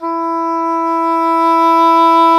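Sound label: WND English Horn, Swell; Roland LCDP04 Orchestral Winds; Eng Horn Swl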